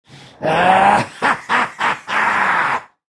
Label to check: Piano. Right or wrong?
Wrong